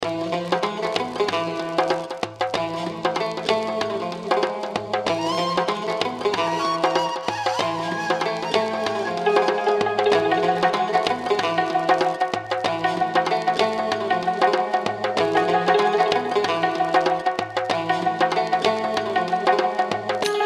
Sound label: Egyptian Gramophone